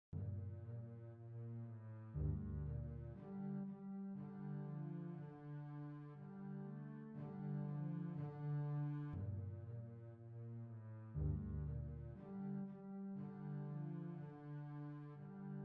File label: Lament Double Basses